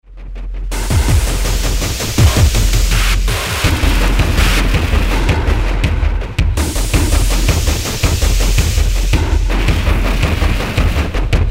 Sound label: distort